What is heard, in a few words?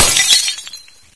bust glass, debris; mistake coop